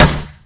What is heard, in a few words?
Plastic Rubber; opensim; CollisionSoundsAssetSet